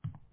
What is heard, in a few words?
steps indoor; gamejam-22